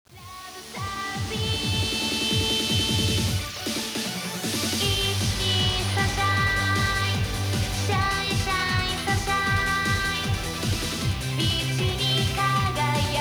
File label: karaoke bgm